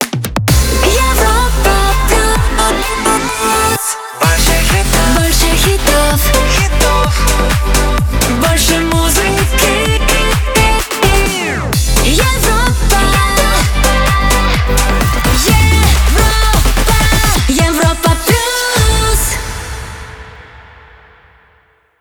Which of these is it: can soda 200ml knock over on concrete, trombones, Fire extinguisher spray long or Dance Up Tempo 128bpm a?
Dance Up Tempo 128bpm a